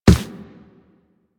Run Default, Footsteps; Warframe; Operator

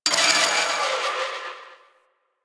Spring Bounce, Ambience; Warframe; Corpus Railjack

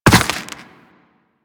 Land Soft Ice, Footsteps; Warframe; Operator

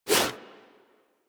Wall Jump Foley; Footsteps; Warframe; Tenno; Wall Run

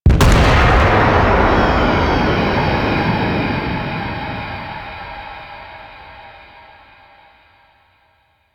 Infested Golem Radial Smash; Warframe

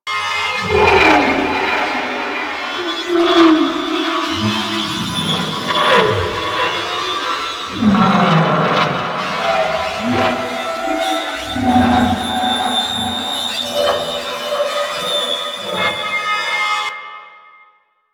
Vocal Swan Song Loop, Enemies; Warframe; Teralyst; DE

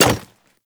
Hyena Micro Step Default, Footsteps; Warframe

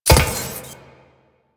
Moa Step; Warframe; Quests; The New War; Veso

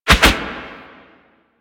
Melee Swipe; Enemies; Warframe; Necramech

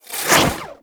Archon Whip Spin Whoosh; Enemies; Weapons; Warframe; The New War; Nira

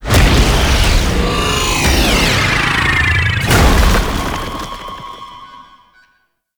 Death; Tau; Twelve Minute War; Dactolyst

